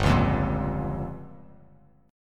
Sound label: G Minor 7th Flat 5th, Bass Standard; Gm7b5 strummed; JGuitar